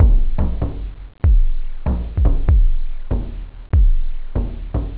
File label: tom, loop, house